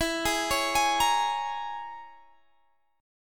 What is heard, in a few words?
Db Minor 6th E